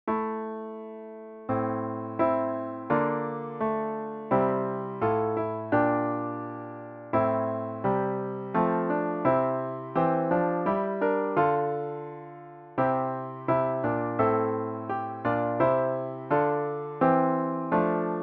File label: Phrygian